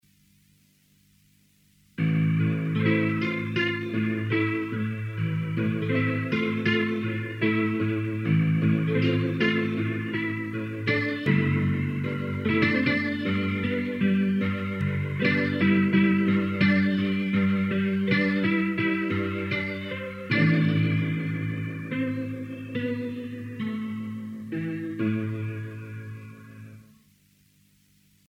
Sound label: m300 piano leslie